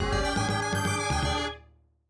Unknown Jingle; Shining the Holy Ark 1996 Saturn gamerip

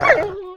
wolf hurt

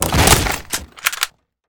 police breach

